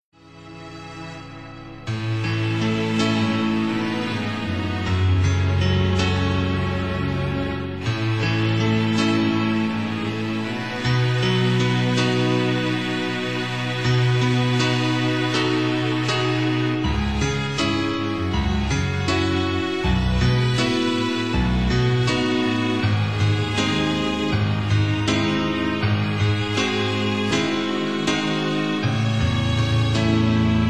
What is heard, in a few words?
Piano Song Instrumental